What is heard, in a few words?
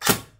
airsoft; weapons